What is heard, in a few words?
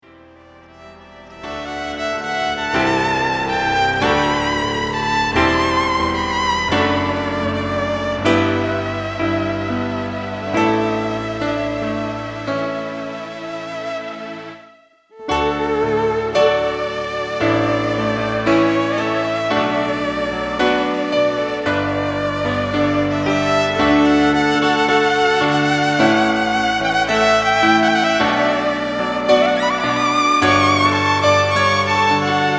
Violin and Keys